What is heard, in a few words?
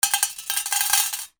MONEY